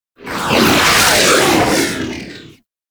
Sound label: Fighter Ship Close Short Fly By, Space Fighter Ship Engine; Warframe; RailJack; Enemy Ships; Corpus